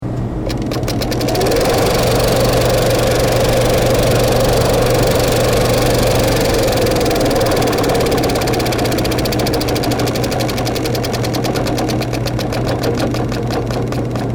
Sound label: projector